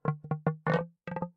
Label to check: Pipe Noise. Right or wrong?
Right